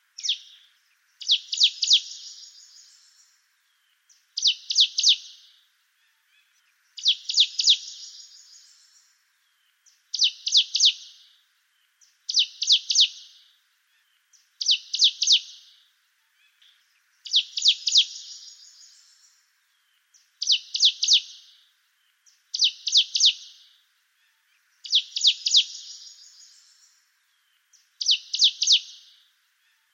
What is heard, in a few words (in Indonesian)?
suara ciblek india masteran